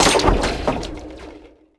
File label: suspension cables break